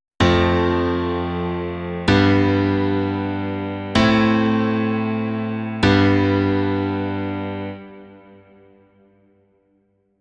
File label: piano